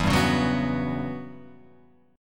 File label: E Minor 6th Add 9th guitar chord, Open F; Em6add9 strummed; JGuitar